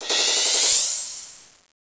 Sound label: crsh; techno